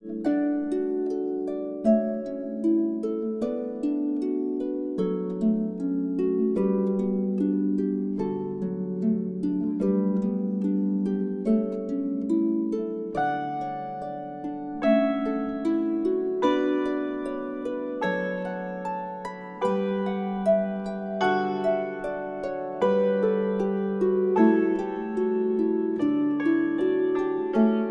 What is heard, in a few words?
Kanon, auf Harfe & Hackbrett; advent